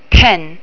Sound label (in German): kugelschreiber